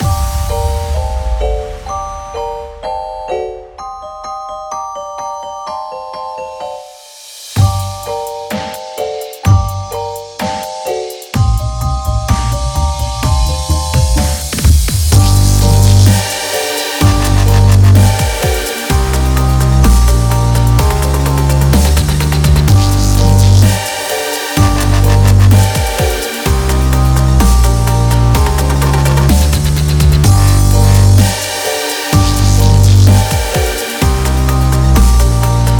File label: Classical Dubstep